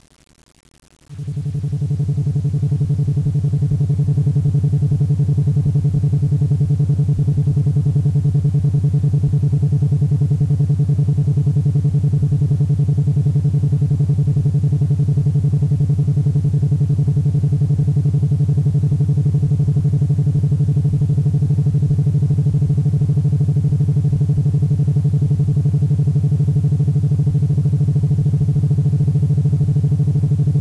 ufo; Sound Duplicated by First Witness; Magnetic Anomaly Detector Activation

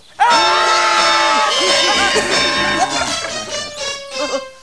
scream

